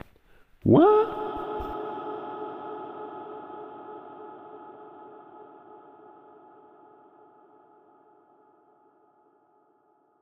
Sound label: wha echo reverb